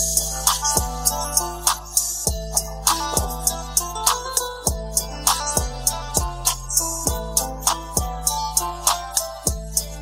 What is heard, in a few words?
xiaomi mi down beats ringtone